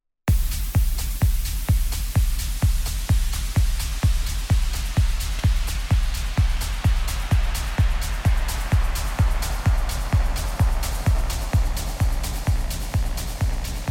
RMX 60 Reverb

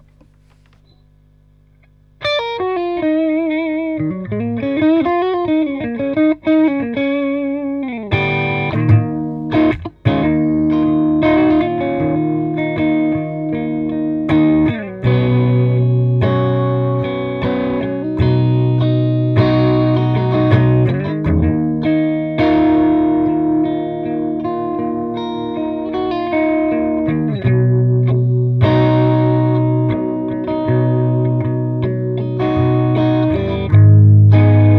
AC30 Clean

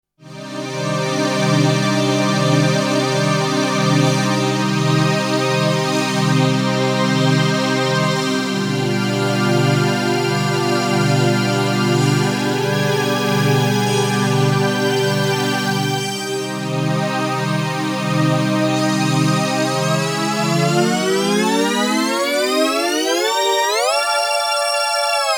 ASM Hydrasynth Slide Strings

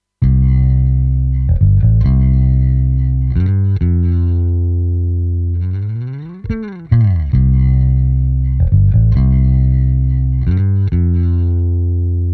WES Audio Mimas Bass Original

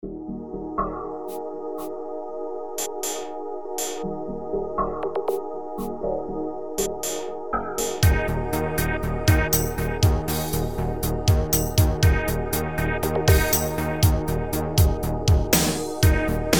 sampler arrangement; Cult

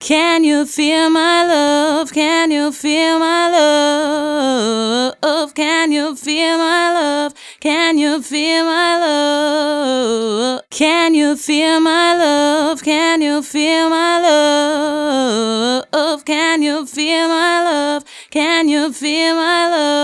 Vocal EQ

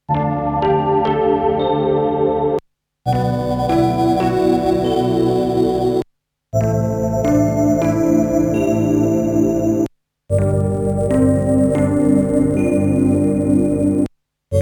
Transposition

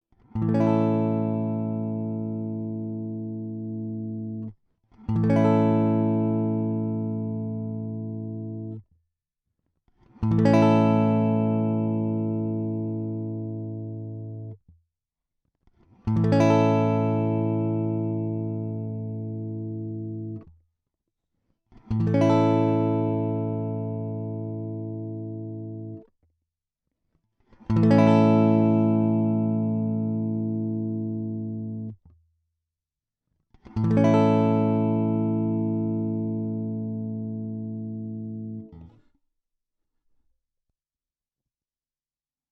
Git Impedance low to high; Cloud CL-Zi

